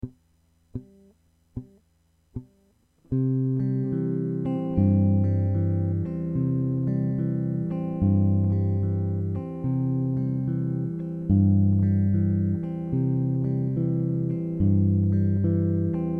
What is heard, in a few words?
alt bass